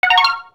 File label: RED COIN; YWW